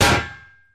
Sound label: plate, Percussion Ticks; Misc Percussion